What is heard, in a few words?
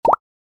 btn positive